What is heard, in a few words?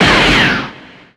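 Battle Gun Critical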